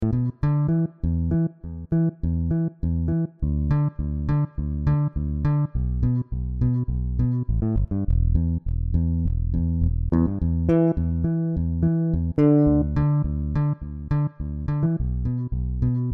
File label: BASS